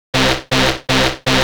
Dance Synths, Hardcore Loops, Synth Loops

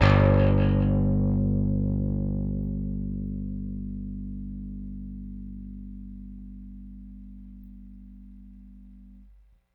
Rickenbacker Bass; Airwindows

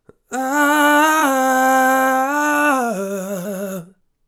Soul Vocals Gm 140 BPM, Melodies; 99Sounds x Monosounds

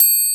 bell

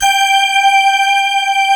SYN D50, Roland; Sound & Vision - Gigapack I CD 2

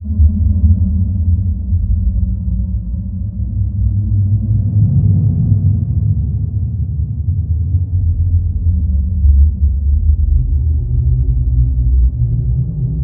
Low Drone, Dark; Zero-G Ambiosis

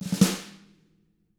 snare ruff; ILIO; Double Platinum Drums 1

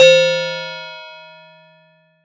PRC, Yamaha TX7 synth drums

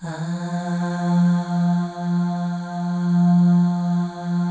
AHS, FEM; Spectrasonics; FEM.2 AHS

